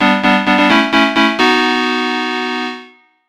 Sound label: Level Clear; bullet party